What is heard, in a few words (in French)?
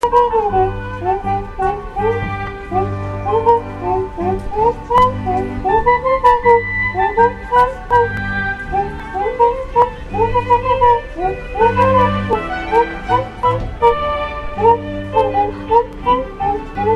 cuica classique